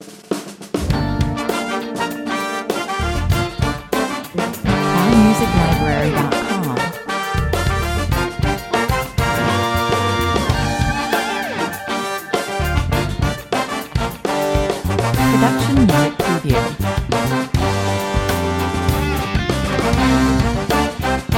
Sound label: with guitar; short version